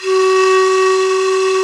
FLT, Sicuri Pipe; Club-50 - Foundations Roland; Flutes +

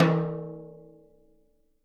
Living Drums, JZ XTOM 1

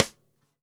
DW Red Maple Cross Stick; Sonic Foundry (Sony Creative Software); Vital Drums

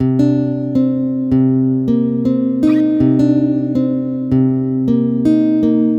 80 Cm Guitar, Melodic Loops; M8; Electronisounds

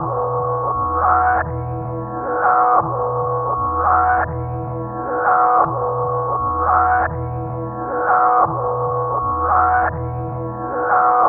Dark pulse, Pads; Sonic Foundry (Sony Creative Software); Synchro Funk

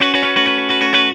GTR KISS, Funk Gtr & Wah; PlatinumPhattCD2; E-MU Producer Series Vol. 8 – Platinum Phatt

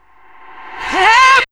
125 FX VOX, Big Beat Drumloops; USB Soundscan vol.25; AKAI

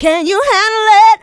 Female Vocals; Houseworx

